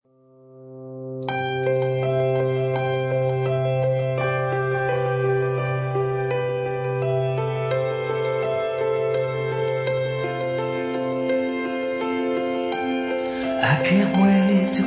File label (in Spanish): Rock Melodico